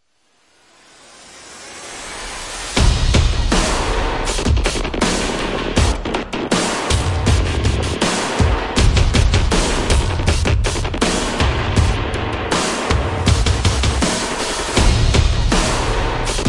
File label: Percussion; Fired Earth Music